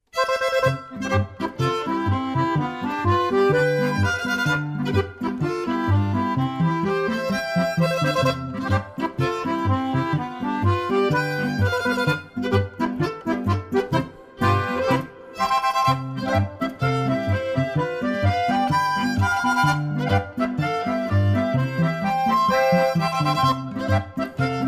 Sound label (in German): Polka